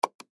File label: Mouse Click Hard, Apple; Computer; Technology; Computers; Electronics; Blastwave